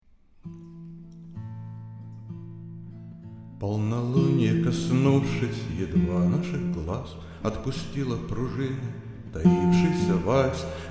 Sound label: vals vocal